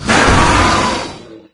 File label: attack, wraith; doom3